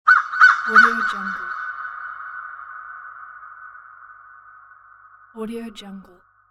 haunted crow call

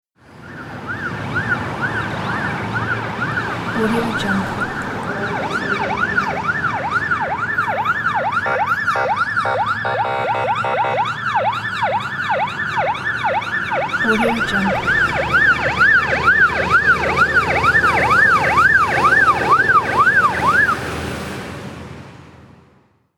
ambulance siren pass by

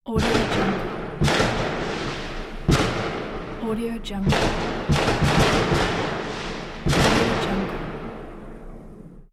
firework

